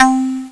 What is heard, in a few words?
pluck, SQ80